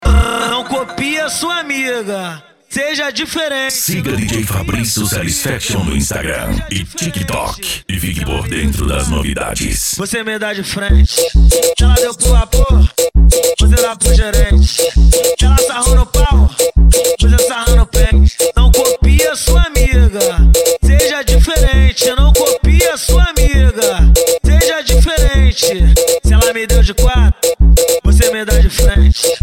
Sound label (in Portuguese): carreta suprema funk